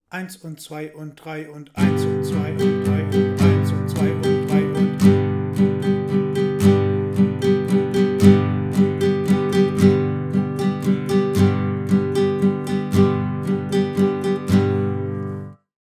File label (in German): Strumming im Dreiertakt